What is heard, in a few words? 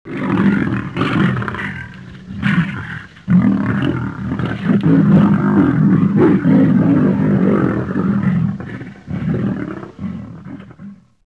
lion roar